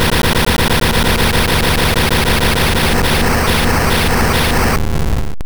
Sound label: Fire Blast; attack moves; pokemon; gen 2; gsc